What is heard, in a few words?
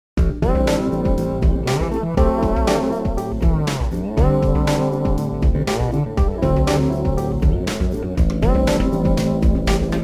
Fretless